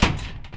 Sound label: file slam, percussive